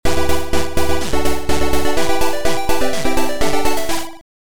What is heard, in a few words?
Game music; Mr. Slidey